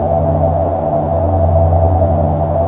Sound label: drone machine, ambience